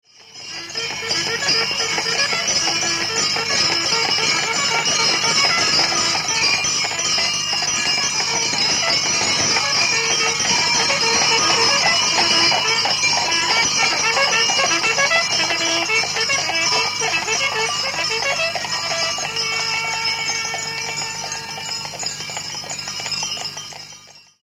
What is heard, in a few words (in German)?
posthorn ambient; Posthorn-Ambiente; Kutsche und Postauto; Reisepost - Unterwegs mit Kutsche und Postauto